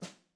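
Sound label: snare piano, marching; Membranophones; Struck Membranophones; Legacy Snares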